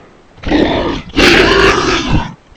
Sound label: predator bark